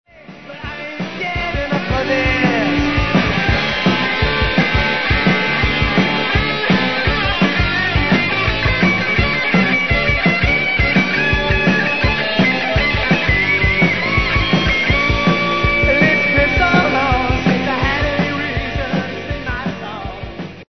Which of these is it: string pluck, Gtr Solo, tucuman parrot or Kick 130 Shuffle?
Gtr Solo